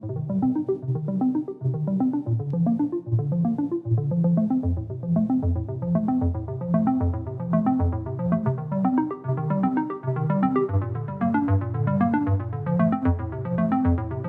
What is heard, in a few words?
synth